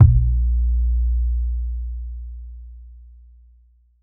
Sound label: tr 808 synth drum kick a sharp key; kick drum samples